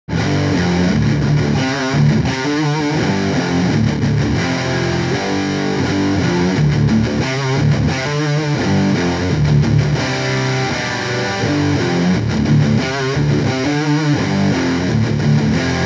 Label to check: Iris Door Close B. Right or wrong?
Wrong